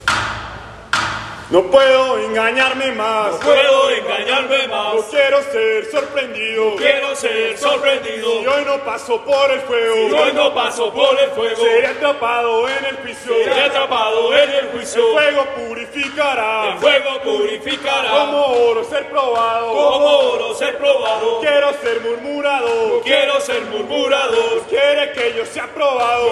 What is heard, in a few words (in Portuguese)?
Grito de Guerra